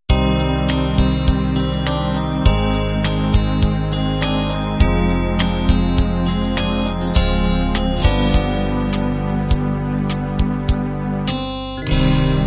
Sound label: pop ballad end